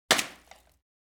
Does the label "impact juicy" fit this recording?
Yes